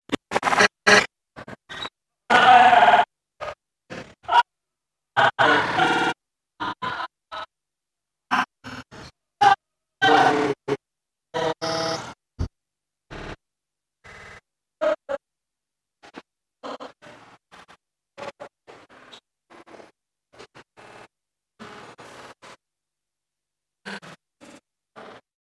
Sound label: sound design television tuning random bursts good for horror; fork media